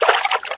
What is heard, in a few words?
water Out; blobAndConquer; combat